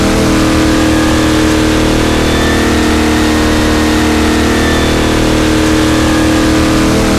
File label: jalopy high